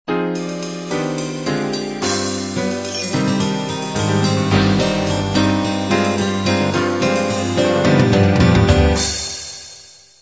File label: love 8beat intro